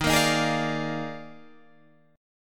D sharp Major 7th Suspended 2nd Suspended 4th, down, Guitar, Drop D